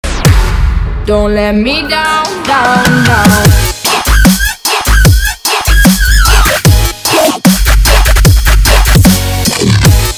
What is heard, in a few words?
dubstep remix